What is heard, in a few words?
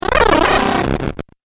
Game Glitch Effect, Video Game Sound Effects; Twistedtracks